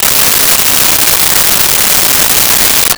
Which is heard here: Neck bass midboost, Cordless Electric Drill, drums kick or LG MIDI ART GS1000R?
Cordless Electric Drill